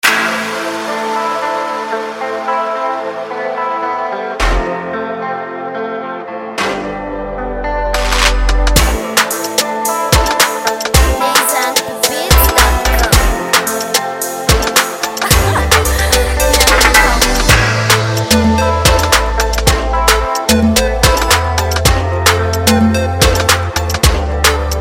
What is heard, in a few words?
AFRICAN INSTRUMENTAL